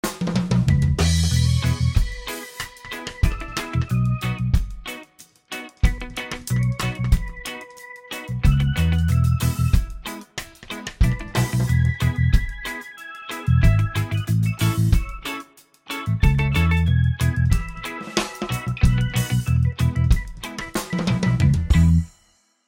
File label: reggae, happy birthday